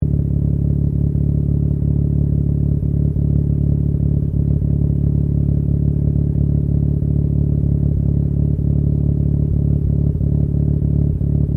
CAR MAZDA RX7 SERIES3 EXTERIOR EXHAUST IDLE CLOSE LOOP; Car Mazda Rx7 1983 Series3; Cars (Sound Effects:Vehicles)